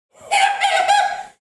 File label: laugh; "HAHA!"